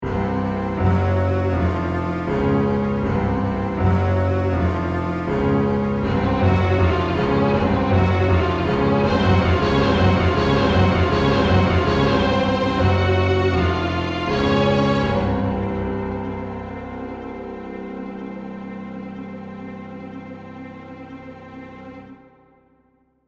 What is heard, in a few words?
Composition Drama, Horror, Musical, Composition, Dramatic, Haunted, House, Creepy; Scary Soundscape